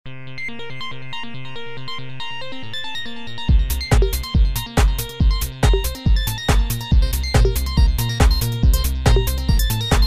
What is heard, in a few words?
joystick, Jingles et loops